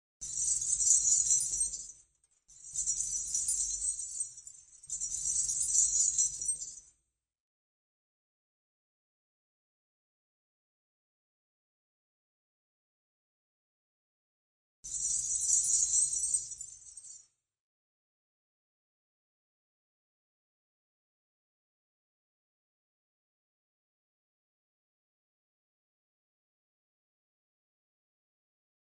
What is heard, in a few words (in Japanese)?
furin